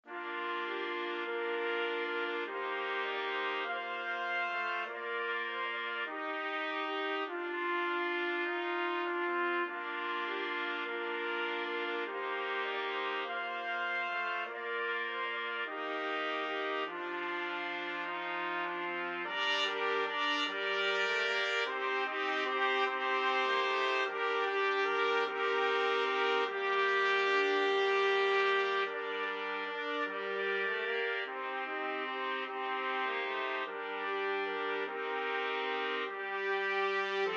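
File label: 4 trumpets; trumpet quartet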